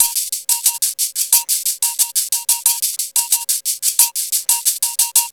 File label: Percussion, R&B Drums